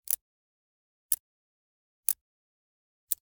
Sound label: Generic Click Metal Tiny